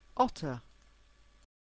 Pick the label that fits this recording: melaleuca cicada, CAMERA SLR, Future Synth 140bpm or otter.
otter